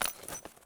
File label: pouch drop; Escape from Tarkov